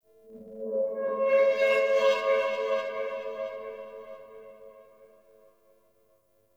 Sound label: Texture Interior Medium; Sentient Lair